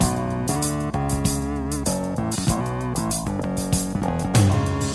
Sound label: bass, Loops, Sad